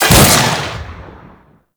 Shackle Cast; Warframe; The New War; Deacons

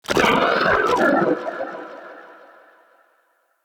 Vocal Death, Creatures; Shrimp; Vocals; Baliq Shrimp Glade

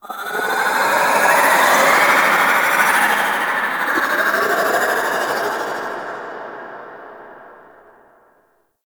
Golden Maw Ambient Whispers; Warframe; The War Within

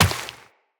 Step Rock, Footsteps Walk; Characters; Tenno

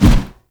Heavy Melee Swipe, Weapons

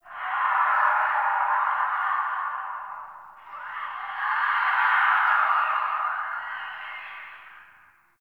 Distant Infested Screams Winter C; Warframe; Ambience; NineteenNinetyNine

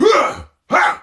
Attack Effort; Warframe; Tau; Dax; Ashigaru

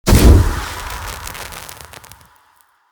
Blaze Armour Impact; Powers; Fenn

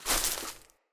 grass step; Characters; Ambient; Sprout Farmers

